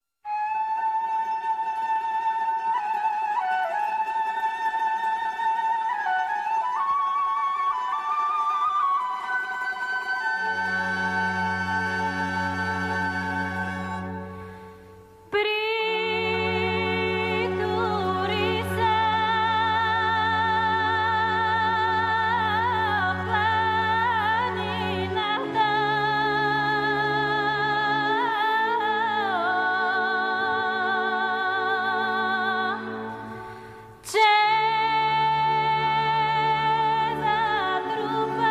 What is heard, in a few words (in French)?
voix bulgare